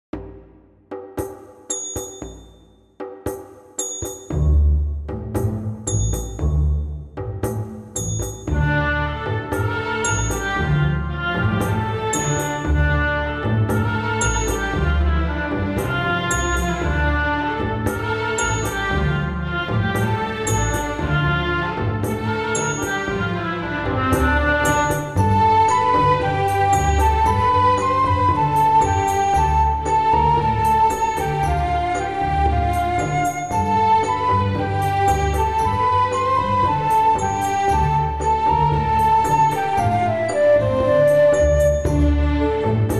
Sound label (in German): arabischer tanz mittelaltermusik nach einer spanischen cantigas